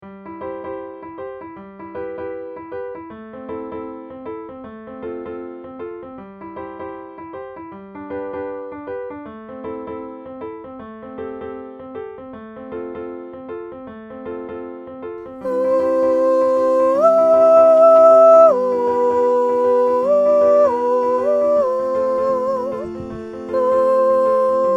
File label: Soprano